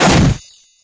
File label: Creature Melee Magical; Kilta